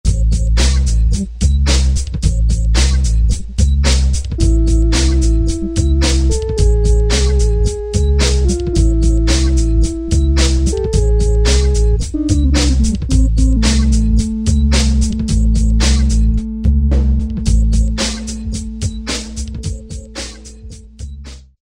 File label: Alesis Z1 Hip Hop; West Coast